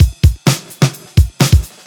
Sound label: Housebeats, 128BPM; Vengeance Samples; VEH2 Loops